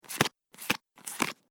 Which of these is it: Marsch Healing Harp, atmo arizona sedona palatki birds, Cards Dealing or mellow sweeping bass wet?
Cards Dealing